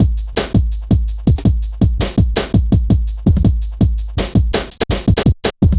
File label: Ezee Rolla, Drum Loops